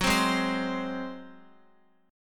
Gb Suspended 2nd Flat 5th Guitar 4F,4A,5C,5G,5C,6E; Gbsus2b5 strummed; x,x,6,5,8,8